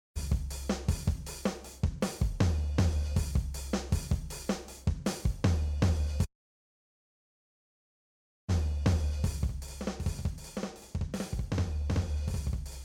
EXT In DELAY Chorus; XILS3

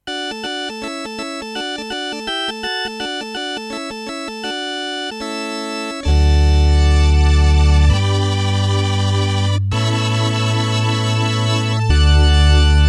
Hammond SK1 Vox Conti Light

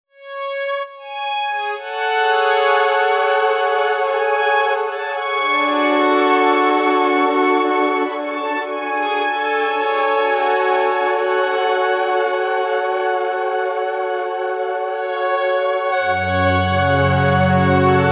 OB E Modulation Pad

